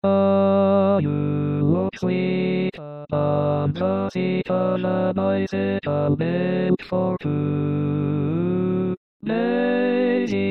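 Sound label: baritone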